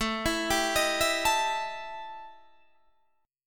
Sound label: E Minor Major 7th Double Flat 5th